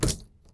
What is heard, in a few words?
Blood Splat